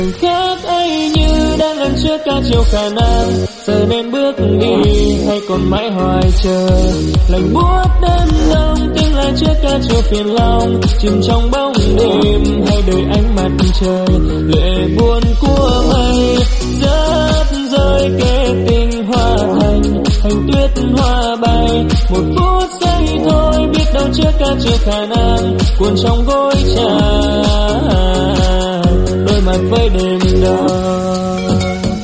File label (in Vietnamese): Remix Tiktok version tieng viet